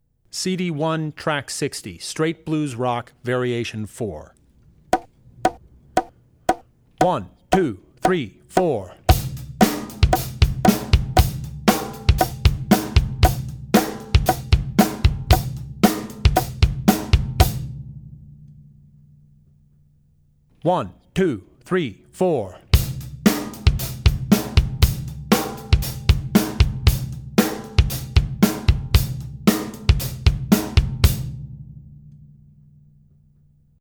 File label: Blues Rock; Blues Rock (Variation 4); Drummer's Bible Tracks